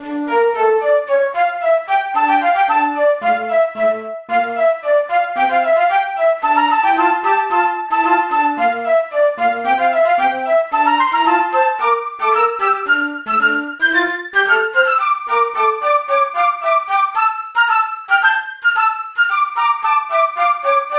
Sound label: Flute; Rhyme of Duotime; The Bard's Tale Remastered Trilogy